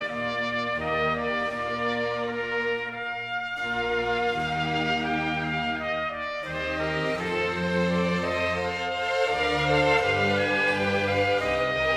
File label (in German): Konzert fur trompete b dur; Handel